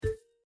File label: Reel Stop; Holy Goat